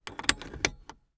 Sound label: car cigarette lighter in; transportation